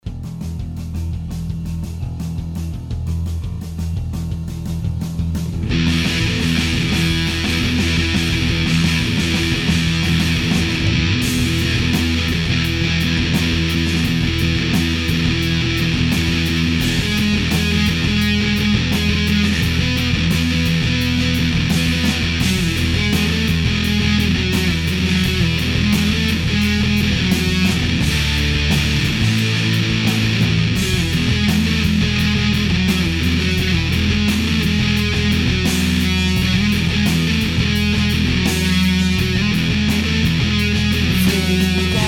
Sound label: flat tone w comp